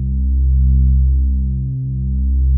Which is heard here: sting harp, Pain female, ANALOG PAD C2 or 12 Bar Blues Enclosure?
ANALOG PAD C2